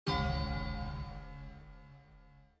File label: chime; azula